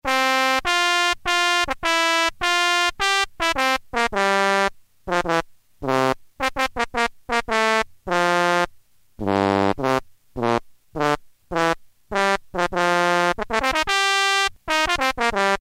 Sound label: trombone, Technics SX-K450 PCM Solo Presets